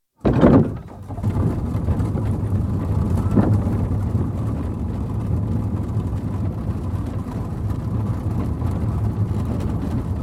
Stagecoach & Four, interior, constant run, window shut, horn blown, stop & doors; Norwich Union; window shut (at 4m43s); horn blown (at 5m34s & 5m45); Car Sound Effects; BBC